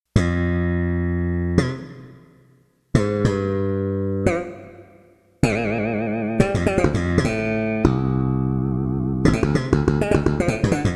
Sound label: bass solo